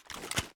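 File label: foley inspect; weapons; gmod; viper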